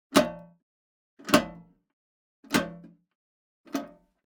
Generic Button Metal Medium

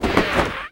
Circus Tent Deflate; SFX Kit; Foley - Misc

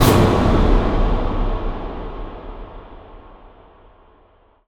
Ice Spell Barrier Defense Crystal Prism; Arctic Aura

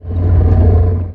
Menu Horror

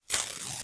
FOLEY Paper Tear; mono; Universal Sound FX

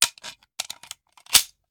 Machine Gun Foley; Sound Ideas; Guns

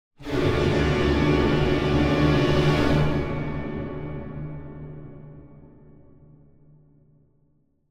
Symphonic Hit Flowing Strings Warning; Hollywood Edge; sound designer's toolkit v2

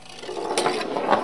Pinball Ball Roll

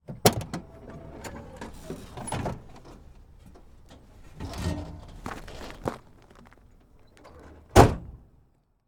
Semi Truck Driver; Large Vehicles; Blastwave

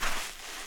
sand; firefight

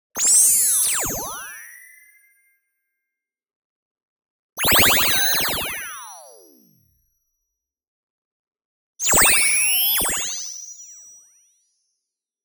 TONAL Two Oscillator Frequency Modulation Long; boom library; Anime Essentials

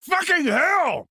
town human far; Blind Drive

